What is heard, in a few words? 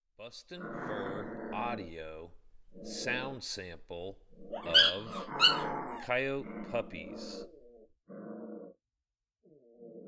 Coyote Puppies